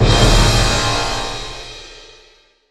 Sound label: contusion, music; Left 4 Dead 2